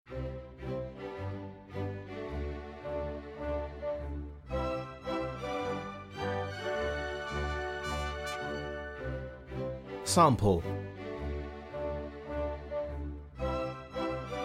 classical

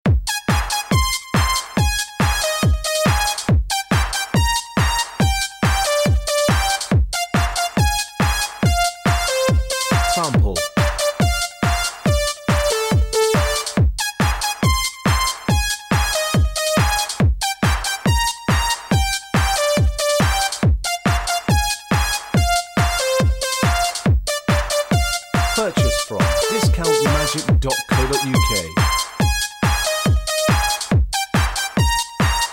techno, backing

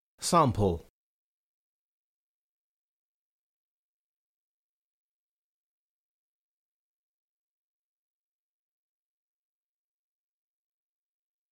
jazz, backing

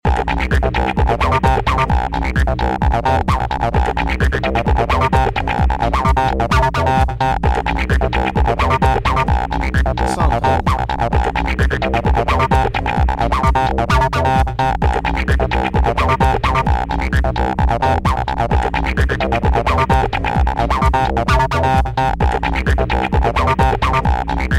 acid techno

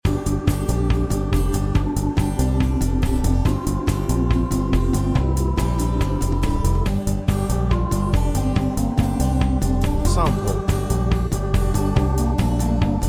remix acoustic organ remix

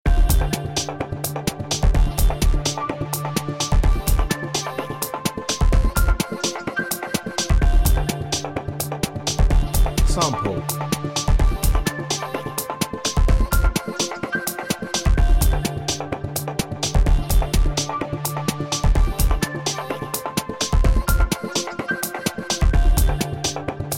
electro, backing

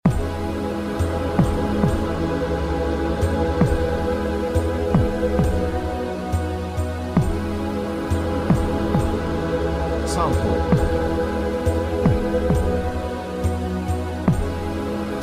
wave synth remix

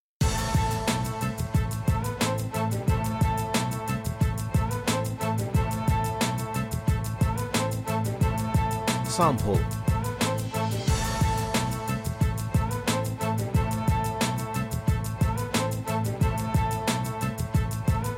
hiphop

